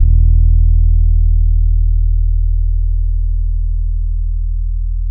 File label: SAMBA, Bass; Spectrasonics; Bass Legends; TYLER SAMBA